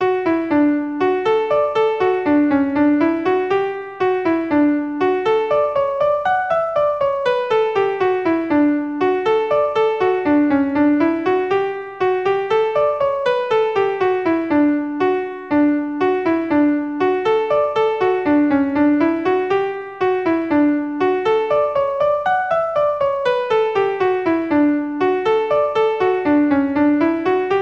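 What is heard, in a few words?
Galway Hornpipe, Traditional Irish Music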